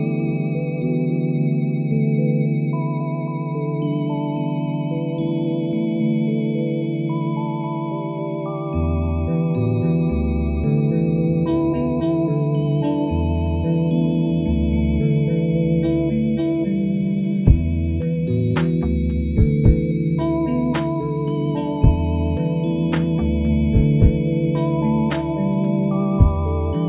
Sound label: Sleep & Snow